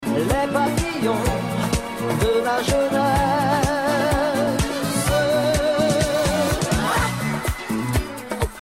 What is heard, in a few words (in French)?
Accord de tonalité Arrêt sur Bm